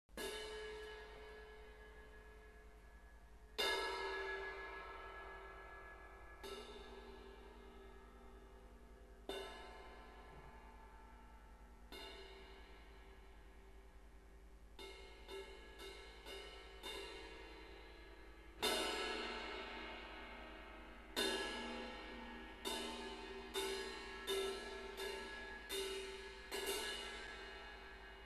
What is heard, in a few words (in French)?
cymbale